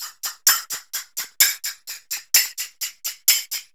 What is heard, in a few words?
TAMB; Zero-G; Chemical Beats